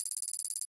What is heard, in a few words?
win count up loop; netent